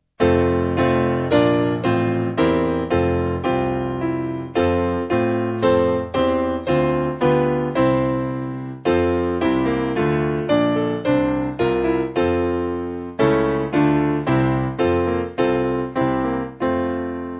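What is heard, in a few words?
Piano, church music backing; Oft In Danger; University C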